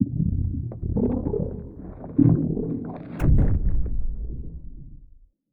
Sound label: tiyanki reanimated death; Stellaris